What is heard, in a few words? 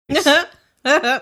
cute chuckles